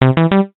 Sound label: WIN; Double Double Bonus; jacks or better